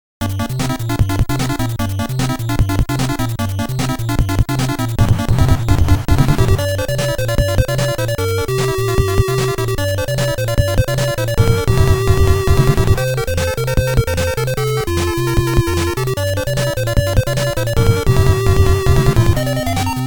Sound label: Bikbiki Samba; Nekketsu Kouha Kunio-kun Ongakushuu